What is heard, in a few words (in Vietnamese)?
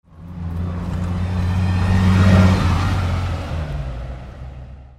Tieng xe buyt chay tren duong